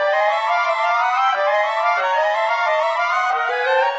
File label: Winds, Orchestral; Sonic Foundry (Sony Creative Software); Orchestral VOL-2; Modern 16